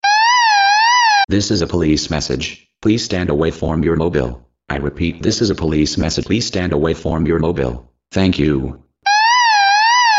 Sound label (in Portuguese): alerta mensagem policial